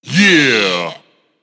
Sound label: Serato Scratch Sample Yeah